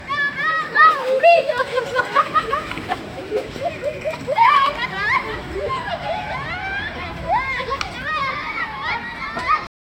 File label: a lively scene outside a, school